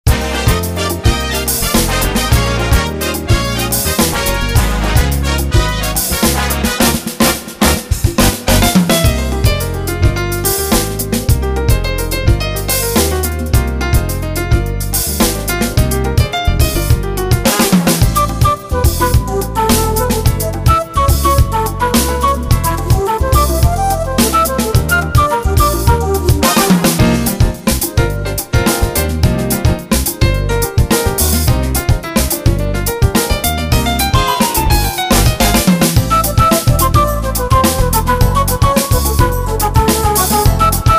grv latin

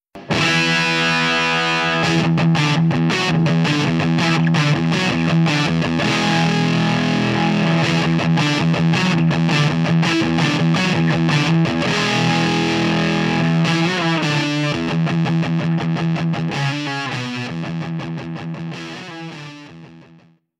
Over Drive

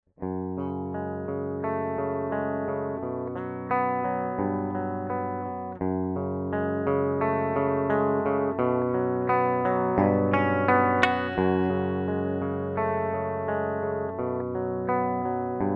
Crunch arp

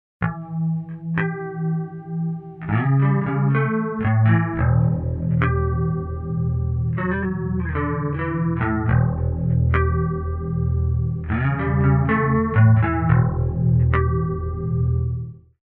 Chorus; AMPWORKS

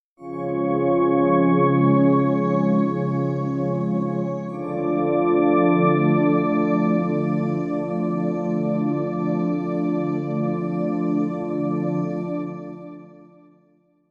Cali Pad

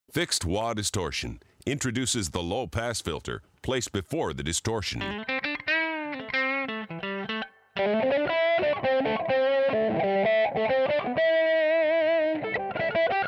Fixed Wah Distortion

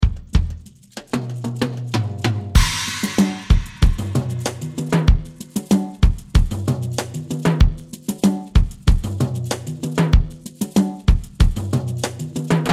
Drums; Chameleon Drums Vol2 Song Demo

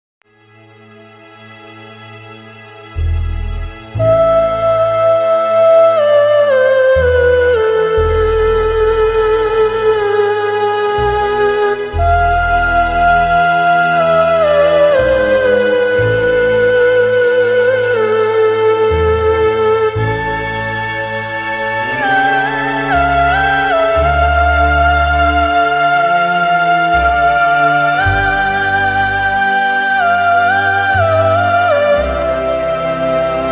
Vocals Main Stretch

